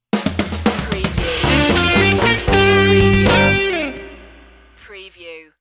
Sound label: cocktail funk stinger